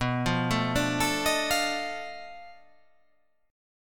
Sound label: B 7th Sharp 9th Flat 5th B down